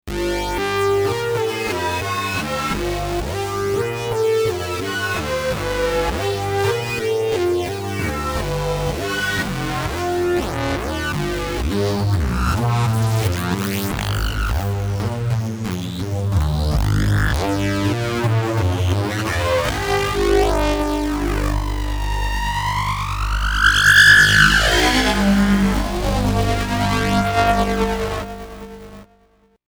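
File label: synth feedback osc; V Synth XT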